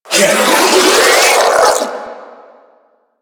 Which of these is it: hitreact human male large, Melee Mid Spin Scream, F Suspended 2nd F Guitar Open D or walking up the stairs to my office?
Melee Mid Spin Scream